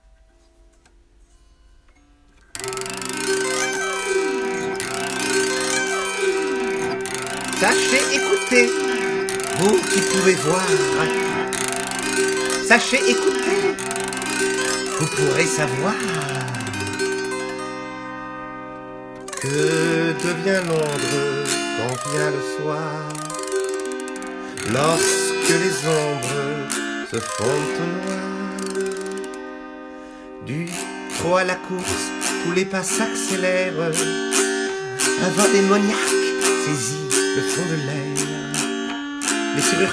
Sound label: Auto Harpe